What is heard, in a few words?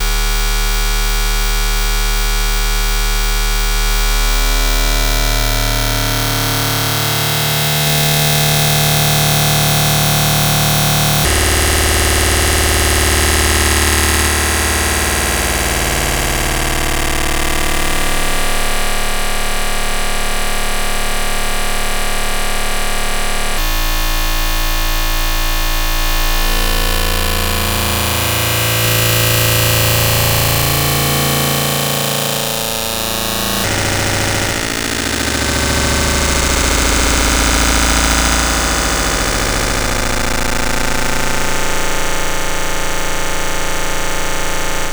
cyclebox rand pulses varying folding